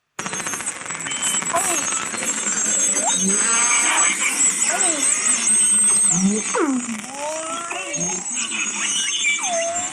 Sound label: beluga hunting calls